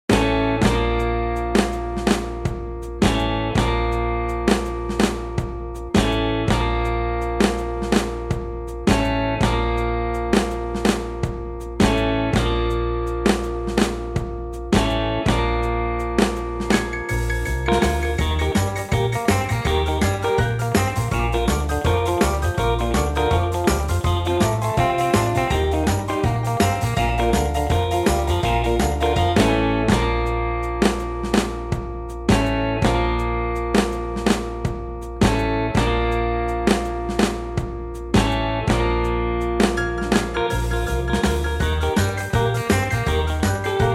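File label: Bb, Backing Tracks for Male Vocals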